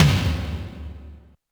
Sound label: Yamaha RM 50 Toms